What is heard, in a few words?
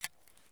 food can draw spoon; Escape from Tarkov